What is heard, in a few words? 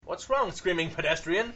screaming pedestrian